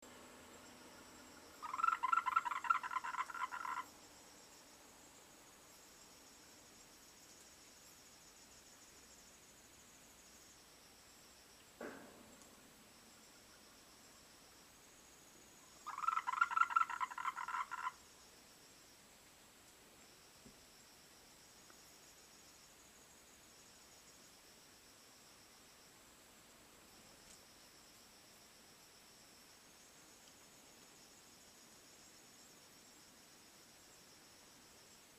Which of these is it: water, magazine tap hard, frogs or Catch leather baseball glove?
frogs